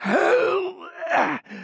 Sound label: die; reaperman; giants